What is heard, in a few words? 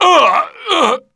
human die; wolfteam